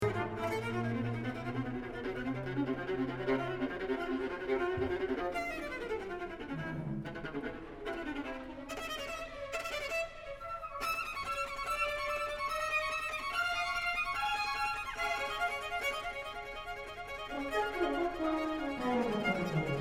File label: Concerto for Viola and Orchestra